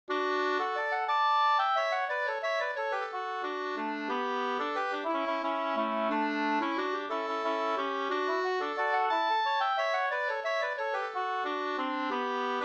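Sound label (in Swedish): vals D-dur, two-part; D-dursvalsen av JE Hall Hs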